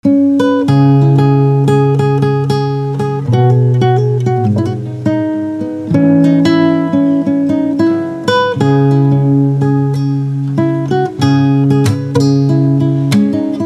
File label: Guitar